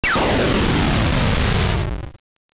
explosion; star raiders

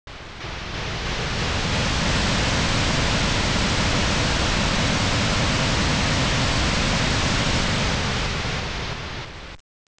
engines; star raiders

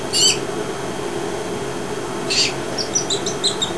feeding, baby

